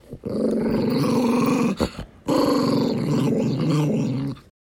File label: Growl